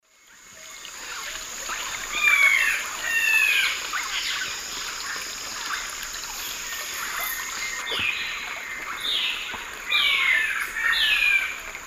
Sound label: Myrmeciza melanoceps, calls; S. America; Eastern Lowlands